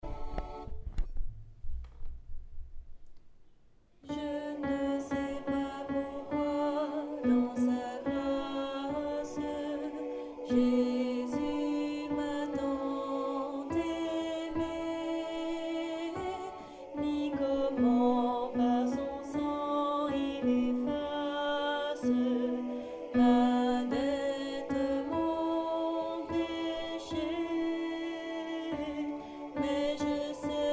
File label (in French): alto